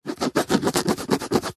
sport rugby ball scrape scratch surface with finger as if removing mark; zapsplat